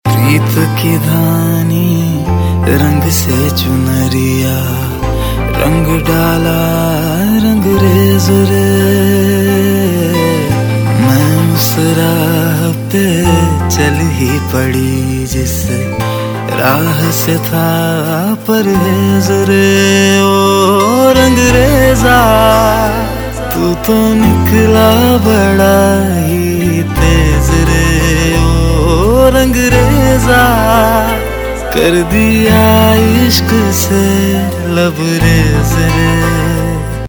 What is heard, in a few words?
Male Version Ringtone; Bollywood; Beiimaan Love